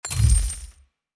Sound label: Shop Entry; starbites